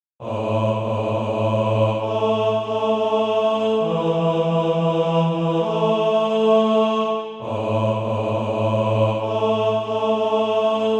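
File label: bass, SATB Practise Music; Deeper Yet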